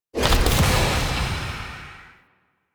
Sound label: UI Mod Activate Ordinance; DE; Warframe; Railjack Player Ship